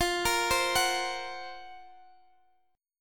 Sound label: F sharp Major 7th Flat 5th F